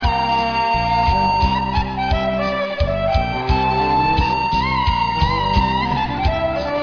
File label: OB bend